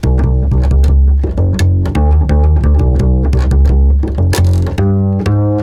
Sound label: Double bass G# 170bpm, Bassloops; Drum Bass; Zero-G; Total Drum Bass